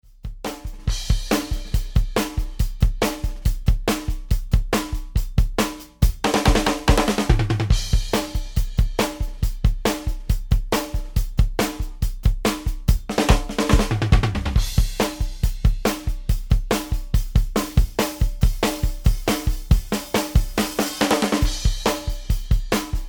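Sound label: new wave, full mix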